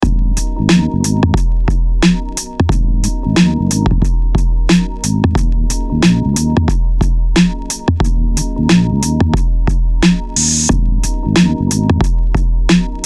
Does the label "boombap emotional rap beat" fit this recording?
Yes